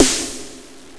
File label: snare; osu